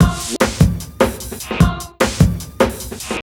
150, Big Beat Drumloops

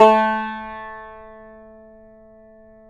yayli tambur

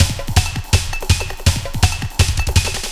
LOOP, Eurotech; Sounds Good

